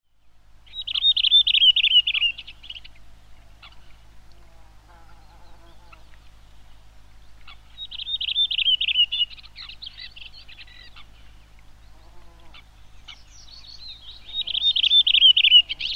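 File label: redwing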